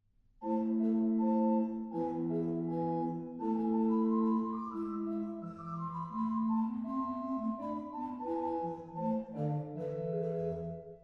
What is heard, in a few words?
Gedackt 8, organ; stade